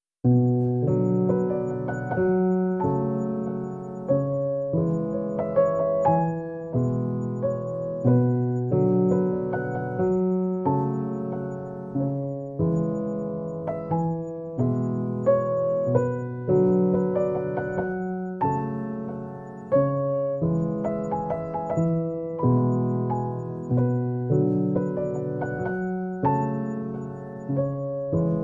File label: The Bluff Trail Instrumental